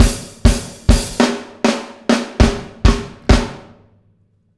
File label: Dancehall 100, Outro, Full Mix, Drums; Sonic Foundry (Sony Creative Software); Drums from the Big Room The Mixes; Demo Projects